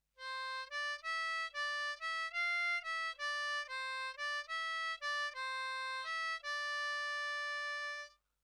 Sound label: 10 Hole Melody 1st half, harmonica